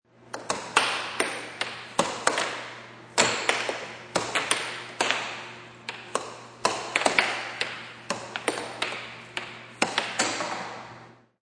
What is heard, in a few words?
Air Hockey, Air hockey game; Part of Air Hockey (Sound Effects:Games)